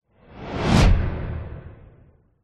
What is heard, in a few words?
sound design whoosh pass by heavy fast; zapsplat